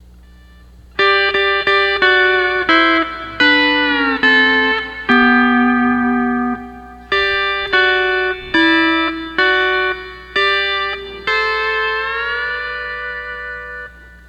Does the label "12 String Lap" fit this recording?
Yes